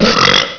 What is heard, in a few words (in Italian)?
Sfiato